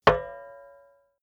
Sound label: plate hit ring slightly damped short; hits impacts